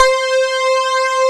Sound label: JUNO POLY C5; E-MU Formula 4000 Series Vol. 5; Protozoa; Vintage Presets